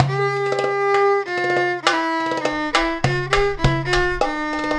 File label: 100 PRC VIO; USB Soundscan vol.43 - ArabianTraditions; AKAI